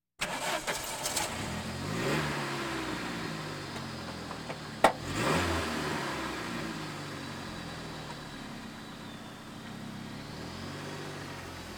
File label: Automobiles various